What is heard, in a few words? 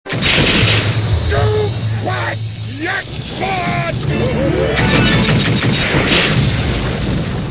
super jackpot